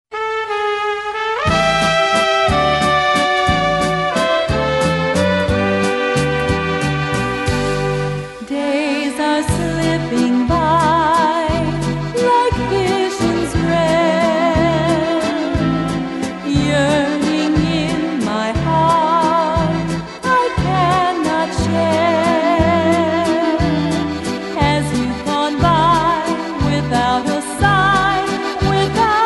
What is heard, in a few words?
Waltz